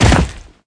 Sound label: Footsteps; unreal development kit